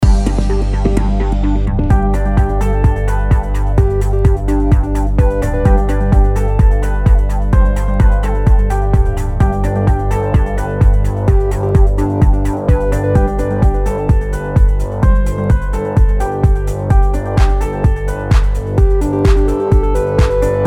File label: Corporate News